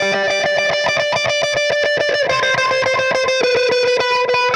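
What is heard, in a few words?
Delay Wah, Guitar; Sonic Foundry (Sony Creative Software); Indie Rock Anthems; Session 02 - Clinical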